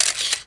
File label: Music Frontend